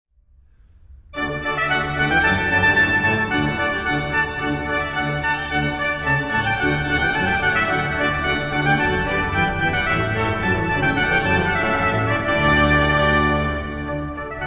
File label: charpentier, Trompete